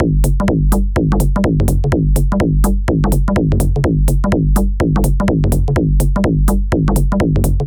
125 BPM, Digital Breaks; Loopmasters; Jon Carter & Alex Blanco; Block Party Essentials

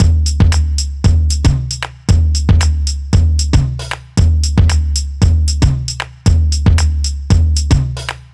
115 Clap Ya Tambs, Breaks; loops; 115 BPM; Loopmasters; Jon Carter & Alex Blanco; Block Party Essentials

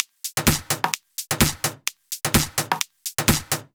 Loop, 128 BPM

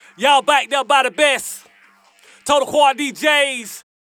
VOX

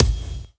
Kick

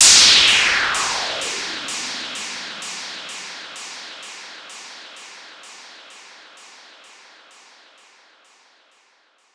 FX Downlifter

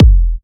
Dirty Dutch Bassdrum; Mutekki Media; Vengeance Electroshock Vol.2

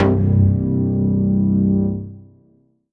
Synth Shot C; Mutekki Media; Vengeance Electroshock Vol.2

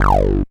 DISTORT 303 G0, bass; Loopmasters; Jack Sparrow; Deep Dubstep Progressions

